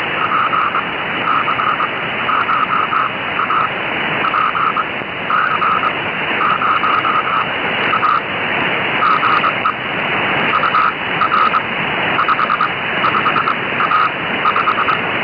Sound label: CW; MM0ZBH; 6M; GI0OTC 50Mhz, VHF/UHF, HF Utility and Military monitoring